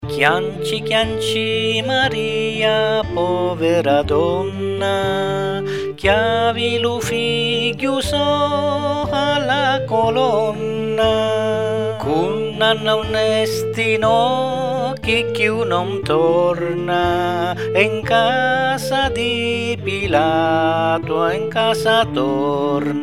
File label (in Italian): voce strumenti; canzoni religiose; chianci maria